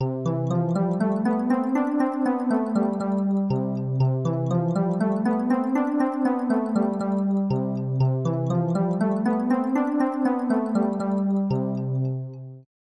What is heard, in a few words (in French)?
creation carillon